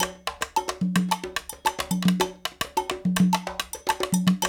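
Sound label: 108 bpm, Latin; Spectrasonics; Supreme Beats; African Contemporary; Latin MM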